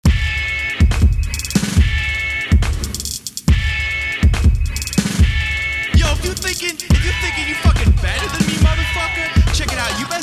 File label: hate noise; starting a witch hunt